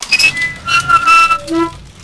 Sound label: door metal bars closing; Max Payne